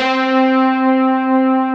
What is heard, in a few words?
STAB